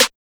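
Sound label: pop snare, drums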